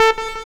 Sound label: SYN, Jungle; E-Lab; XTC Files of Jungle